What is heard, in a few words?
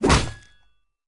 attack